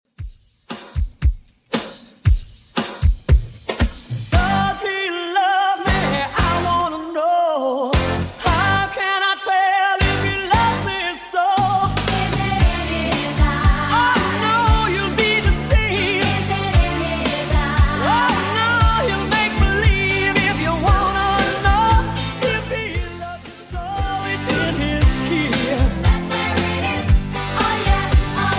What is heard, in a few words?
90s Redrum 116bpm